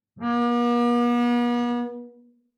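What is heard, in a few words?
Bass arco fortissimo sul G B2, Double Bass; MIS Pitches - 2014; Strings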